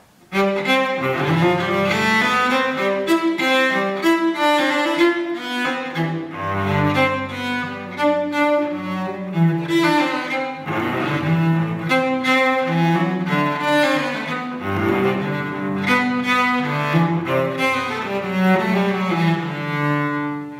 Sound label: cello Sound Sample; Model 4